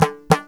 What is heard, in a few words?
INDIAN DR, INDIAN SET; Spectrasonics; Heart of Asia